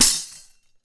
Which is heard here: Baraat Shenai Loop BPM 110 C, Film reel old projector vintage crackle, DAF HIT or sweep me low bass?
DAF HIT